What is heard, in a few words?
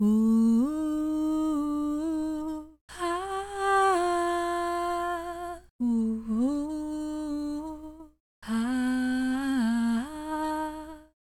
Female Enemy Song